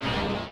Dark Monster voice prepare

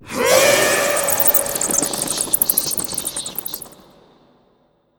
Vampire Disapear

